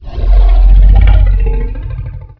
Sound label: roam, Dragon sounds; Unreal